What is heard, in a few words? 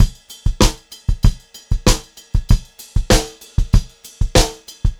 POP VARI, Pop-Rock Drumloops